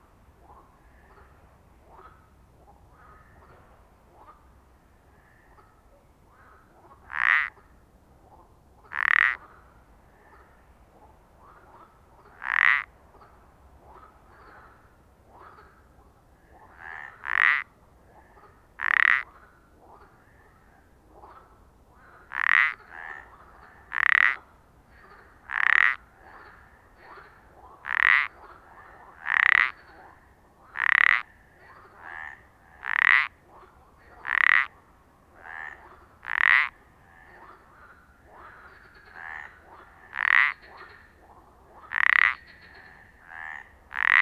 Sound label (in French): tuilerie chant amphibiens pelodyte rainette